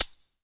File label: SHAKERS; Best Service; Dance Mega Drums CD2